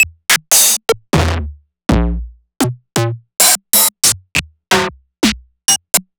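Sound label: Roland MV8800 drum HARD KIT; HARD MM KIT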